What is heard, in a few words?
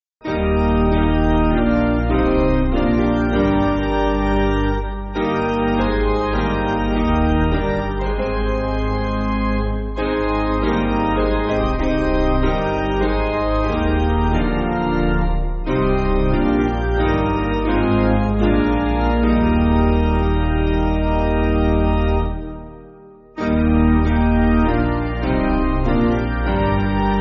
Piano, Backing Files for Churches; Eb